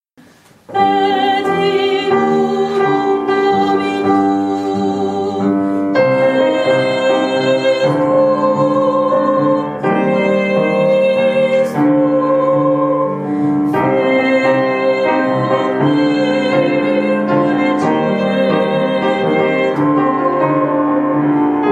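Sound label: soprano; credo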